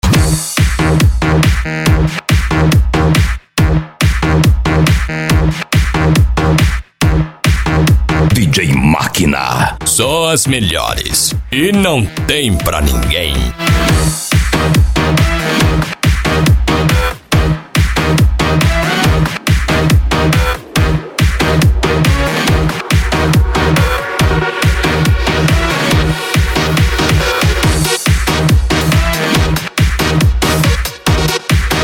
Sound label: mega electro mix